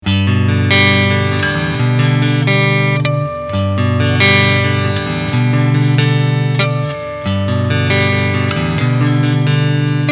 Clean Picking Front SC

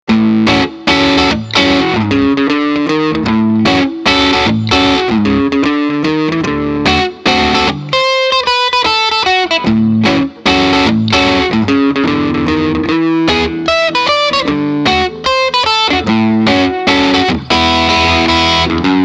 Gretsch G6129 Neck Bridge dist

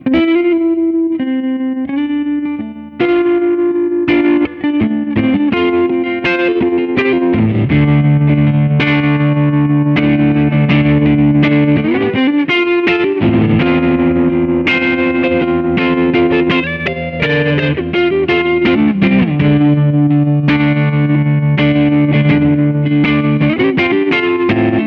crunch

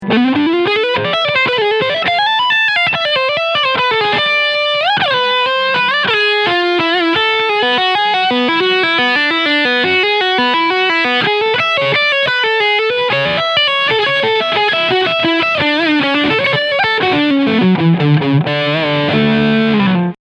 Leadsound rear humbucker; Harley Benton Fusion T 25th Firemist

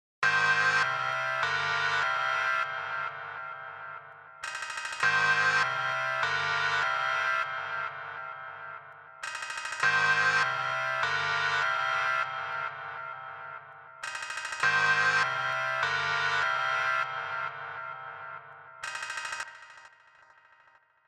Rhythmology North Beach Loop

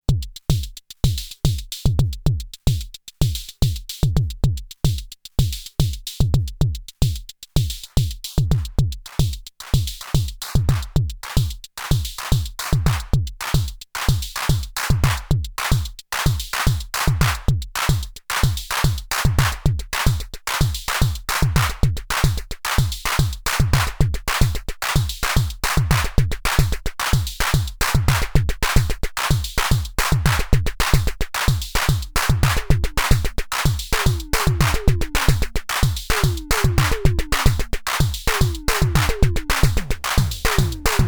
Synth ADS 7 Pattern

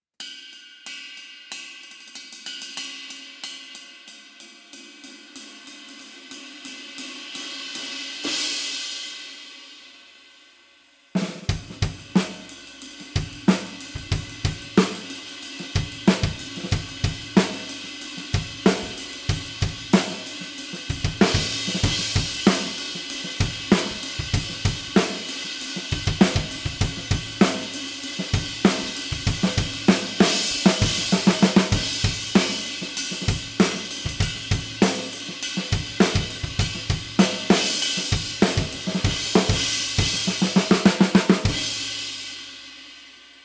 22 Vintage Crash Ride

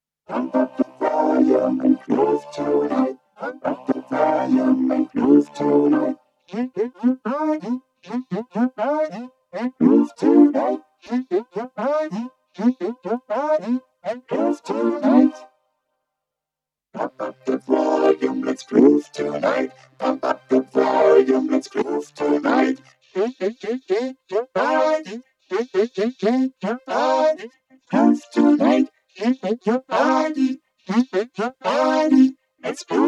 VP330 VC340 Vocoder Pitchshift

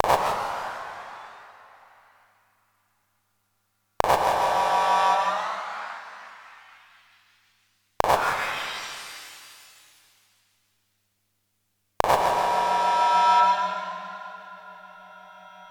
Erica Synths Black Hole DSP2 Click Shimmer Drift

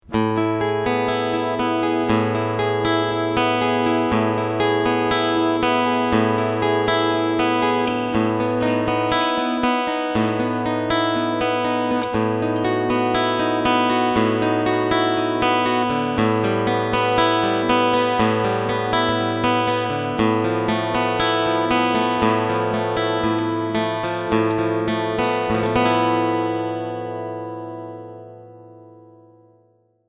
Clean Picking Line EQ12

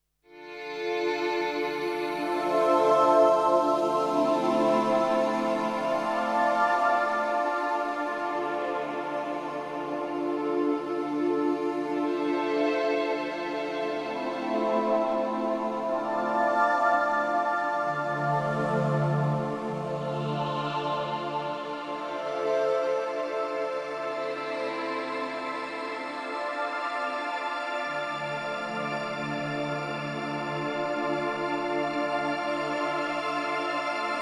Wavestate

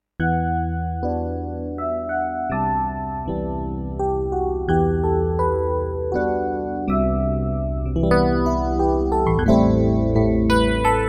VPM Piano

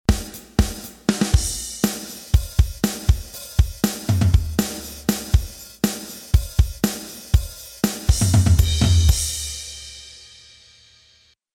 Loop Drums; Samplitude Pro X6

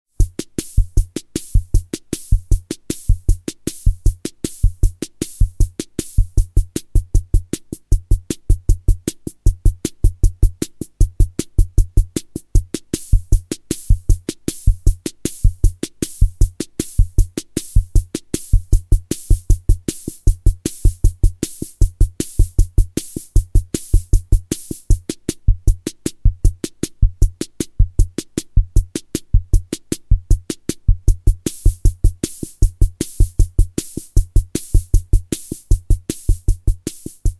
rhythm80 var pop

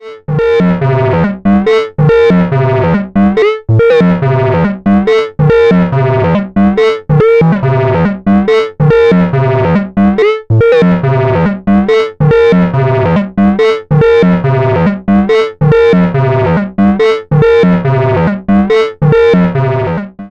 Korg volca modular Motion Sequence FOLD RATIO MOD

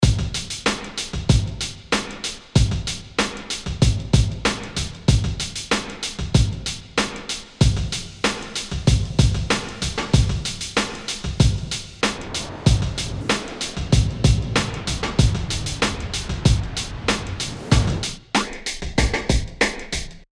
Drums 5 FX 1/4 t Glide

